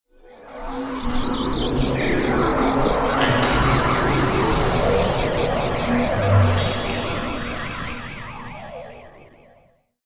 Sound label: Haunted Elemental Force; Ghost Sound Effects; horror sound effect